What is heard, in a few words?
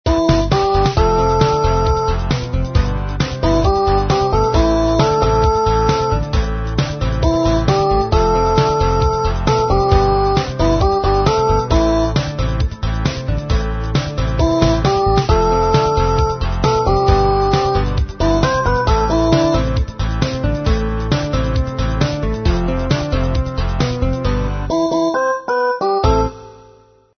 midi